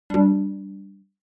OS Sound Notification

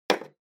Hourglass Put; タイマー機器

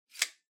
RJ Wall Socket Lan Shutter Close

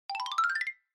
Xylophone Slow Long Up

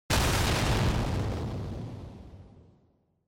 Explosion Short

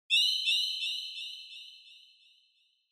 Onoma Flash Low Delay; オノマトペ 光る; 低 ディレイ

